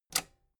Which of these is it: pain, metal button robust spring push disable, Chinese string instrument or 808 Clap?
metal button robust spring push disable